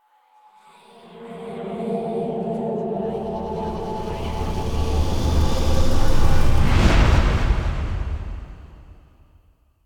Cave Happy Intro Stinger; Duviri

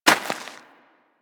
Rodent Hop Step Rear Dirt; Characters; Foley; Warframe

